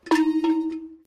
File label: Genie Cow Jump